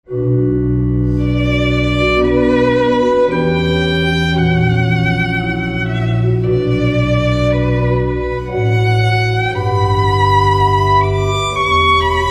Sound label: violin organ